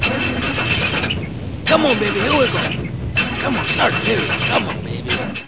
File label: startup